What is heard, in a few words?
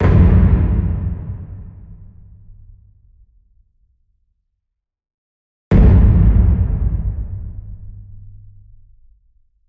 loop; bron pack